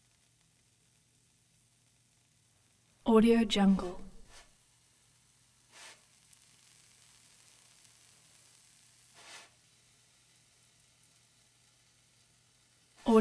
frying pancakes no flame with flips

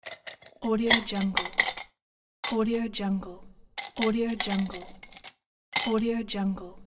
tools

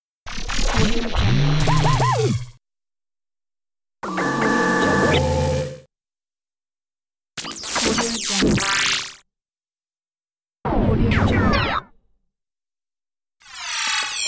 alien tech; livingroomclassic

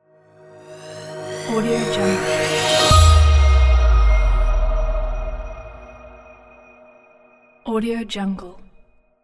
technology logo

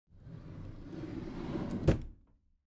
object drawer packed smooth open soft

